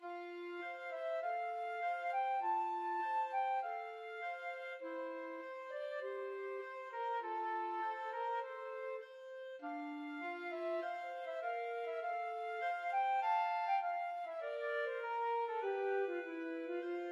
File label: un poco adagio, flute clarinet duet